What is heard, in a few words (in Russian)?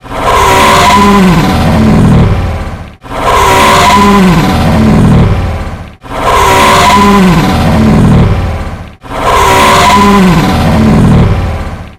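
crazy tiger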